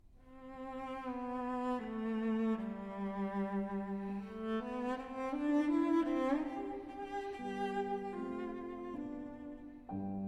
Sonata for Cello and Piano No.4 in C, Andante

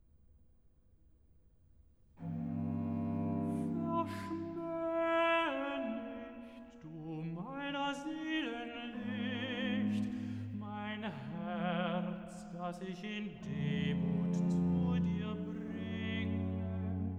Recitative (Tenor); Sie werden aus Saba alle kommen, BWV 65; Verschmahe nicht; Bach; Robin Blaze; Cantatas, Vol. 21

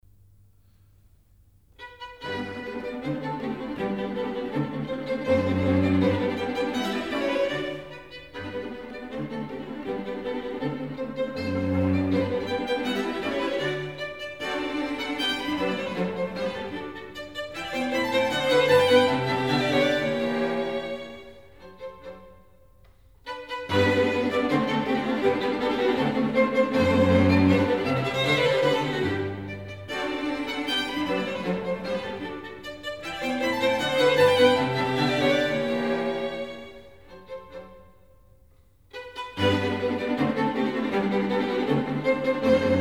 Quartet in G, String Quartet; Haydn; Op. 54

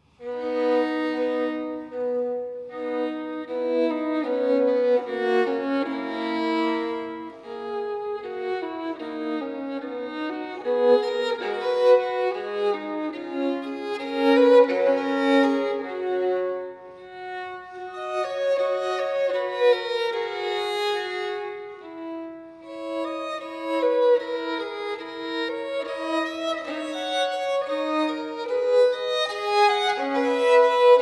Violin Sonata in A Minor Andante; Violin Sonata No. 2; BWV 1003; Bach

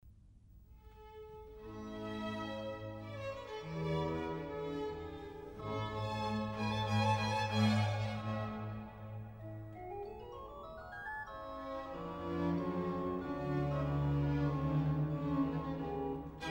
Salve Regina in F major; Wolfgang Amadeus Mozart; Mass in C minor; Vesperae de Dom

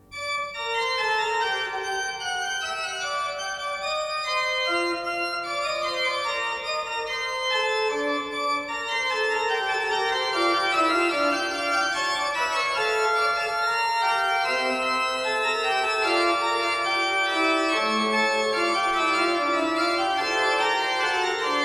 Fugue in G minor, Bach Great Organ Works; Fantasia Fugue in G minor, BWV542 Great - II. Fugue; Johann Sebastian Bach

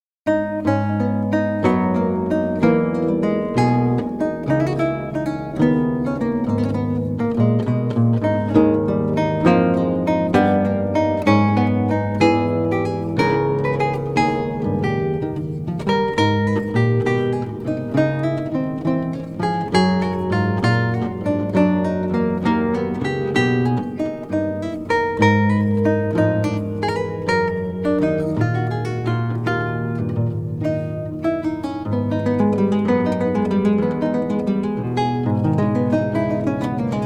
Lute Partita in C Minor, Gigue Double; Bach, J S; BWV 997; IV. Gigue - V. Double

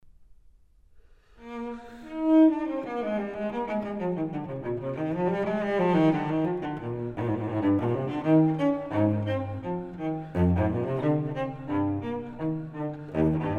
Suite for solo cello No. 4 in E; Bach; Cello Suites

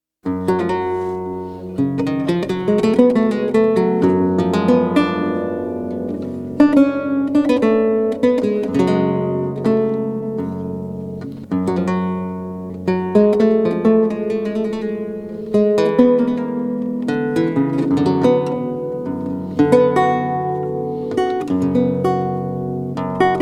Lute Suite in G Minor, Prelude; BWV 995 - I.; Bach; JS Bach - Complete Music for Lute

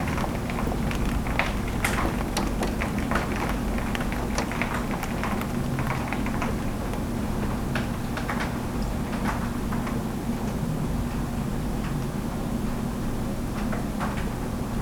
On The Window Sill, Field Recordings Oregon Rain; Omni Gardens

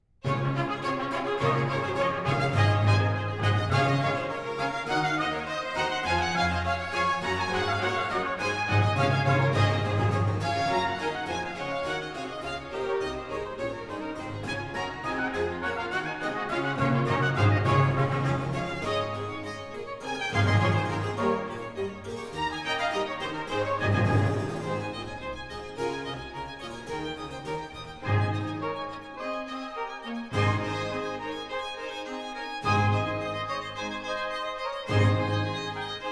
Konzertsatz in D Sinfonia; BWV 1045; Bach; J S Bach; Koopman Complete Cantatas Volume 14

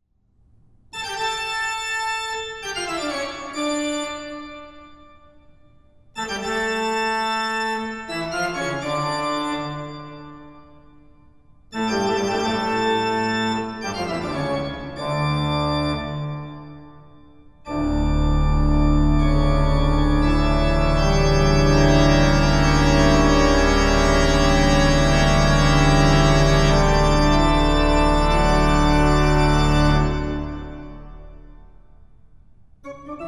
Toccata and Fugue in D minor; Bach; BWV 565

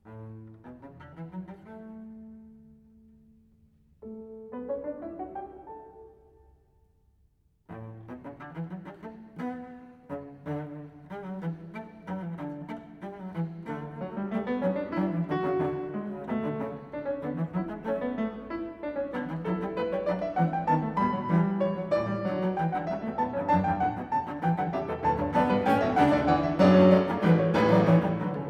Sonata for Cello and Piano No.5 in D, Allegro

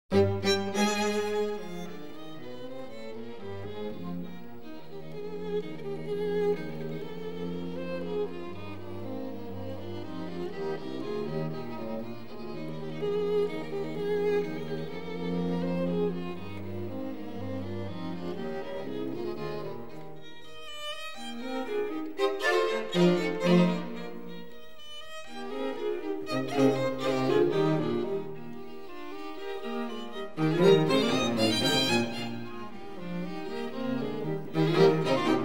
String Quartet in E flat

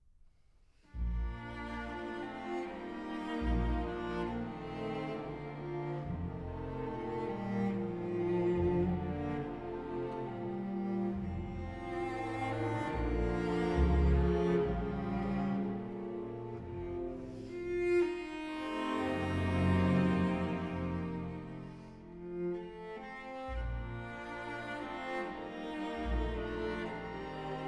Piano Concerto No. 2 in B flat major, Andante; Op. 83; Johannes Brahms

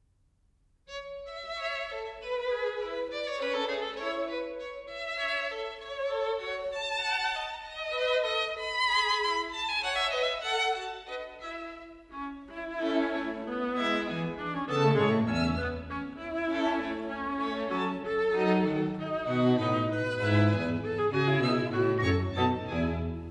String Quartet in A Major Menuetto; String Quartet No. 5; Op. 18 No. 5; Ludwig van Beethoven; Beethoven - String Quartets Nos. 1-16

